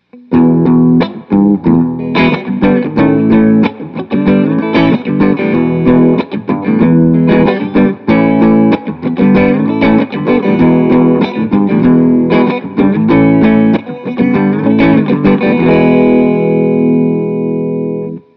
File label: Clean